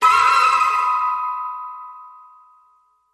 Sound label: Flutter